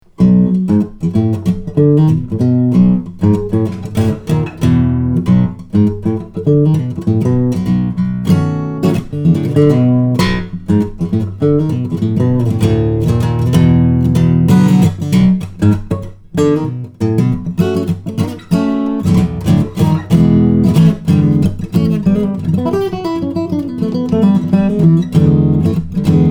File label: mccollum baritone, steel-string flattop guitar